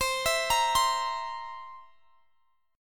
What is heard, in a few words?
C 7th C down, C7; arpeggiated